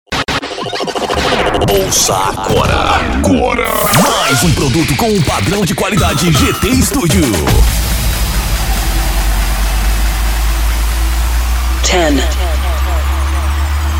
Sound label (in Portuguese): Abertura de Show ou DJ Melody